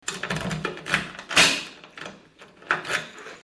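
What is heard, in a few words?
key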